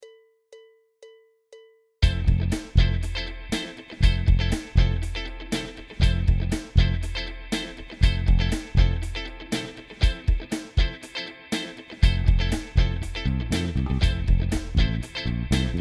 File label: impro fm7